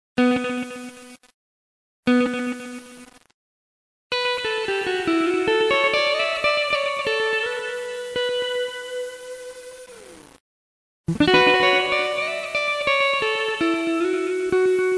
Music Delay Ping Pong

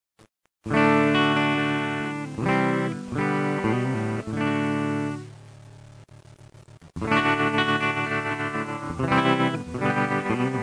Music Tremelo

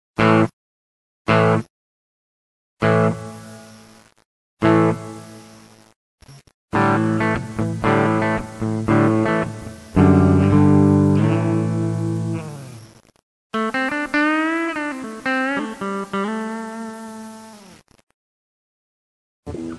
Music Hall

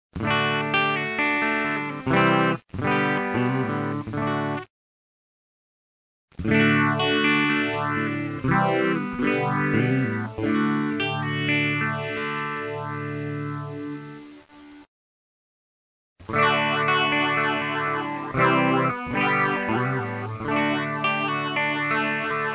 Music Phaser Clean

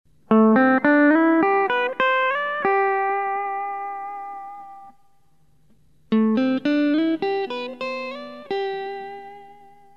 Humbucker Single

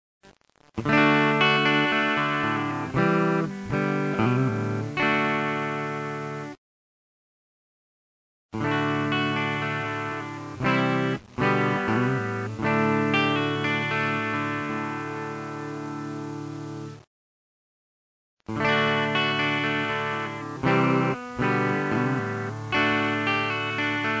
Chorus Clean

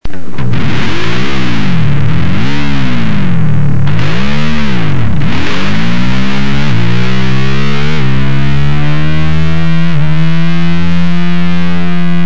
Music Motorbike

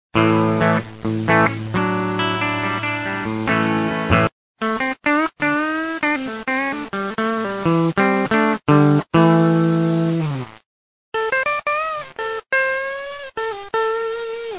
Music Clean

